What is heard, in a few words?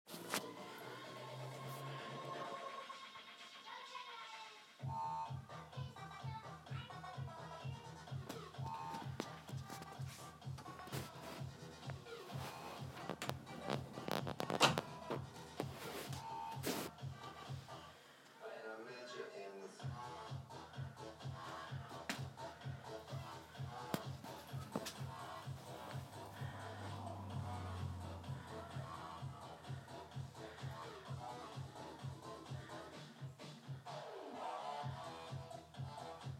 noisy neighbours